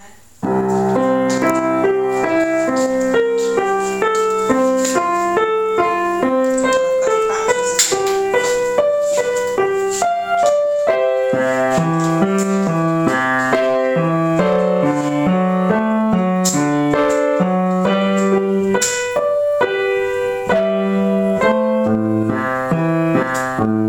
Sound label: prelude in c, keys; j s bach